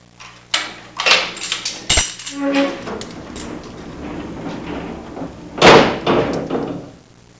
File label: JAIL DOO; Easy Personal Web Page